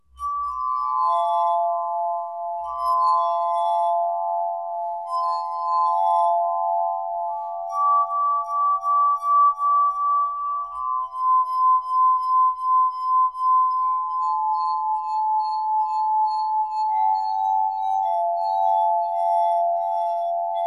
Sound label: Light Crystal Harp Played with wet hand 432Hz; Lekka harfa krysztalowa; Czakra serca F